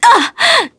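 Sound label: Vox Damage, Korean; Priscilla; kingsraid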